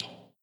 metal ladder footstep; adams venture origins

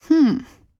Emote Female Confused; Idle Champions of the Forgotten Realms

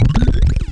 BLiNKiNG

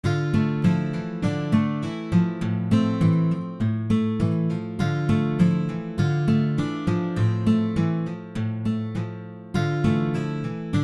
Guitar; Next Day Survival

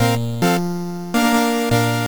Bounce Clear; pc engine; Off the Wall

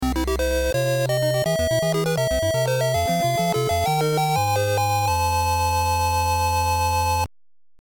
Major Victory; Adventures of Lolo 2

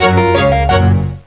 win; Baroque Win; mystery tales time travel; nds

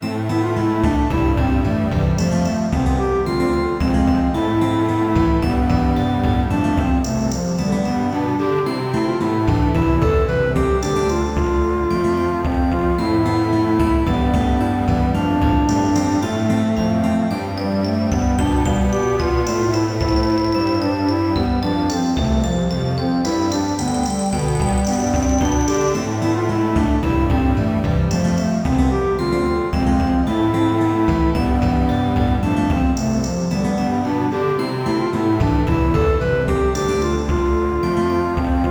Clav; Angelique Special; saturn

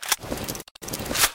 Pistol Reloading; gamerip; Car Simulator 2; android

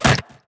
Impact; Rebel

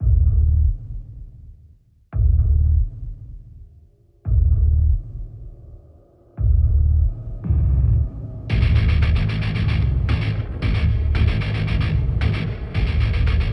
Metal Hell Main Ambient; Nekravol

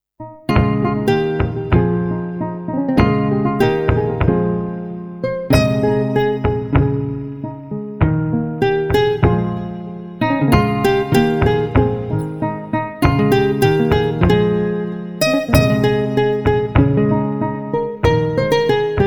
Forest and Wind; Divinity; Musica Obscura

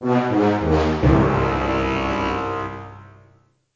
Lose Fare; Cranky

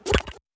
down test

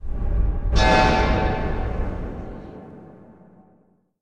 UI flee

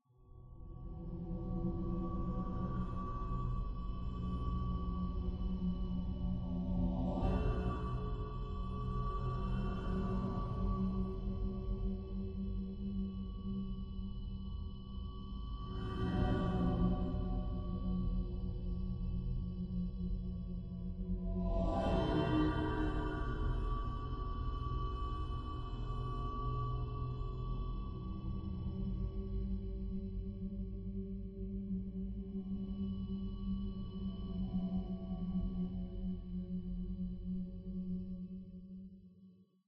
Cave Atmos; Hellblade: Senua's Sacrifice

